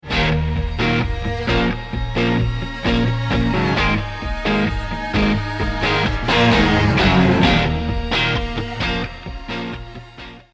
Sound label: lost round, music